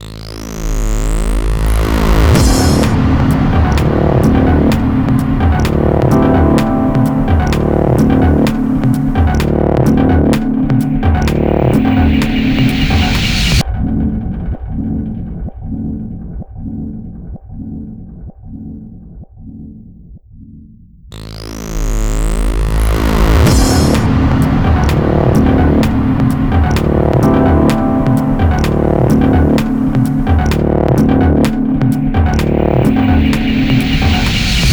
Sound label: Gameover; Mayhem 3D; ps3